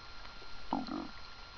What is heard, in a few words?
burps